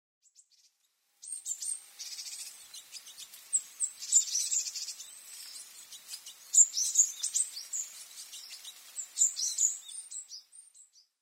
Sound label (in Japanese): amamishijukara, amami, koe; yanagawa